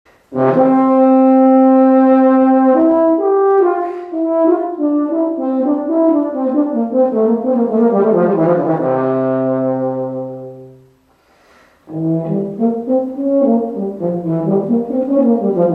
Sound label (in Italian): Euphonium